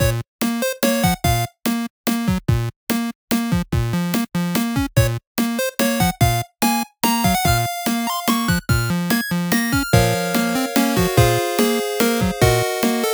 Area 1 Castle Music; Bebop and Tempo

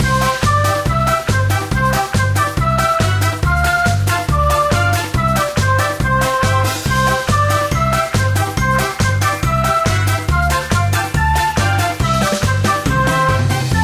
Result Announcement; Summer Party Time

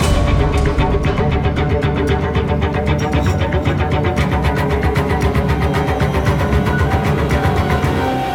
Combat

